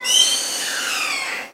Falcon, Prairie Calls. Loud, Monotonous Falcon Call; Loud, Monotonous Falcon Calls With Some Slight Echo; Bird